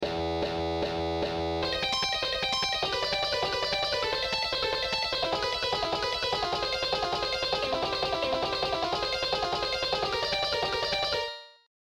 Fast Picking; Shredding Exercises

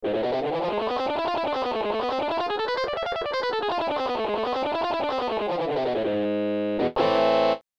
harmonic

